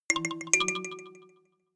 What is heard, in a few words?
notification kalimba delay second up